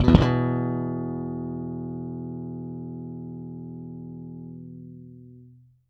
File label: Slap One Shot EB Long, Bass; Bass X